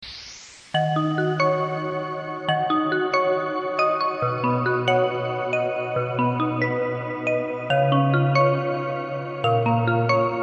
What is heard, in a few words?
nice bell